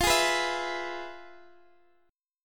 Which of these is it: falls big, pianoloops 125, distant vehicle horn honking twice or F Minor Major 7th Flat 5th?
F Minor Major 7th Flat 5th